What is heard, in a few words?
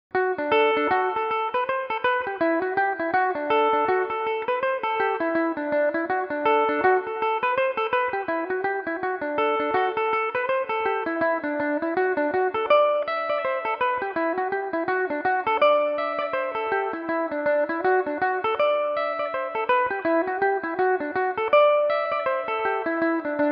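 D mixolydian, Irish mandolin; famous ballymote; belmuse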